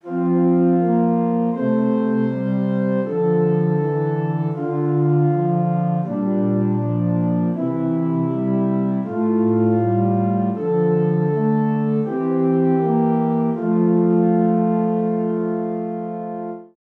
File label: Zwei simmiger Contrapunkt; Ronge; Prima versione dell esercizio